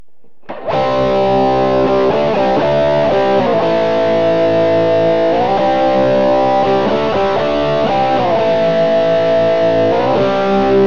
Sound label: bridge crunch; Burny